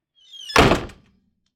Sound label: squeaky wooden door slam; soundscrate